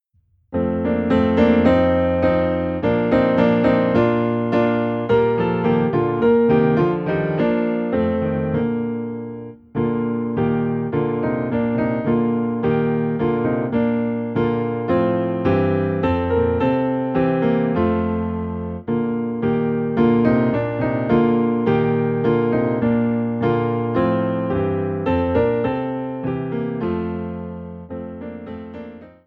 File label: Piano Low in B Flat